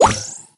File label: mine spawn; brawl stars; bo mine spawn